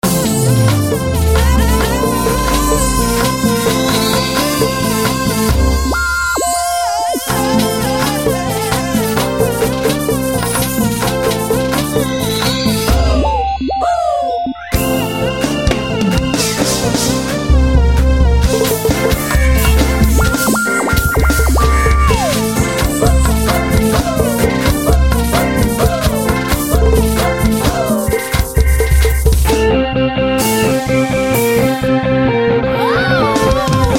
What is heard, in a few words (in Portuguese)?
groove rapido